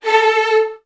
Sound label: A2, VN 16TH, Virtuoso Strings; ILIO; Kirk Hunter